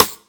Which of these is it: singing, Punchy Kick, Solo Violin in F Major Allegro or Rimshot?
Rimshot